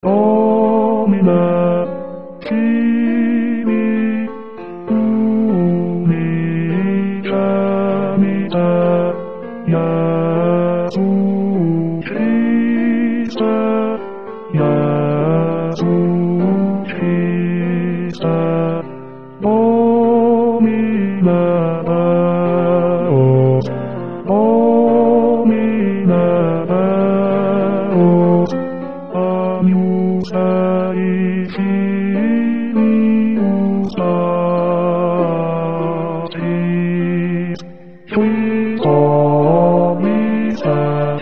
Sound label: bass gloria